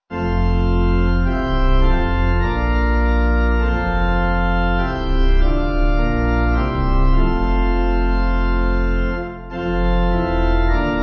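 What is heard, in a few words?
pipe organ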